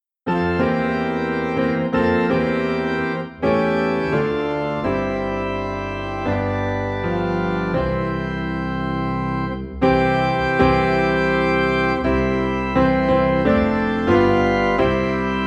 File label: Piano and Organ in C